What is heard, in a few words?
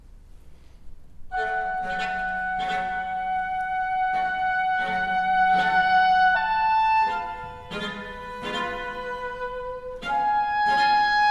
Concerto No2 in g minor RV 315 SUMMER Allegro Adagio Presto Adagio; Antonio Vivaldi; The Four Seasons